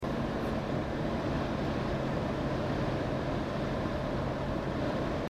gm truck sport interior 70 mph cruise, exhaust systems; corsa